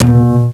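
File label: DOUBLE BASS; FAIRLIGHT CMI IIX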